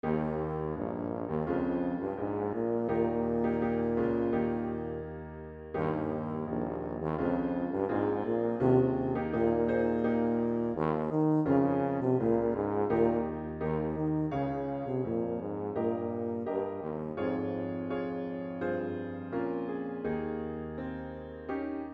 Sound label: Tuba